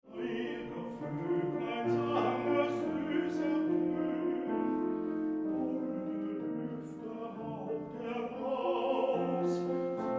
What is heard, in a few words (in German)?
Arie des Sigmunds, Tenor; Wagner; Meisterkurs